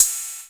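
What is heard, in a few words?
606 Cymbal; Quasimidi Rave-o-lution 309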